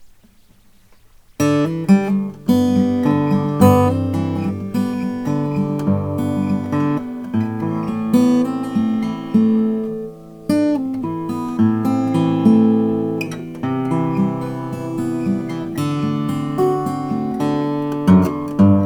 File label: Mic, Mini Grand Symphony guitar; Handmade Mini Grand Symphony guitar offering powerful tone in a compact, lightweight body with premium materials.